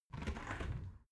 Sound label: window open soft